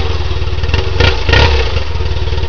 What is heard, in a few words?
gs sound; moto; gs1100